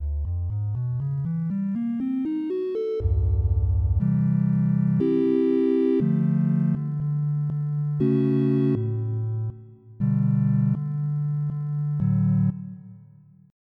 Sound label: Whistle; FF7